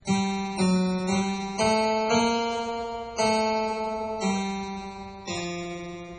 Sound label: harp